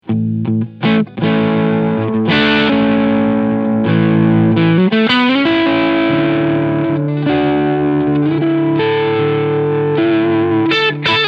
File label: PRS Crunch Front PU